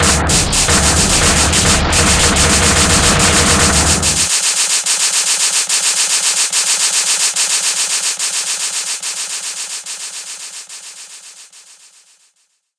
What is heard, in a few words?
breakdown; lbelle